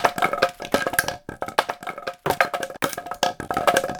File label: Log Stn Roll Lp